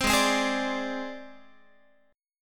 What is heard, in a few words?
Ab Major Flat 5th Ab Guitar; AbMb5 strummed